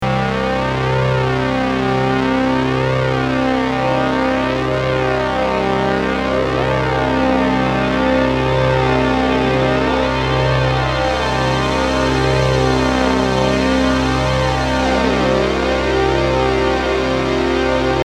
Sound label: juno106 saturated